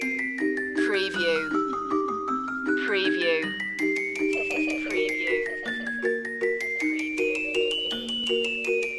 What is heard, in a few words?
freak show loop